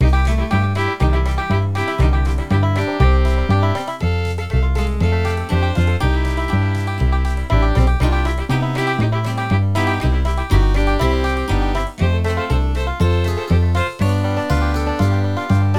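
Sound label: bluegrass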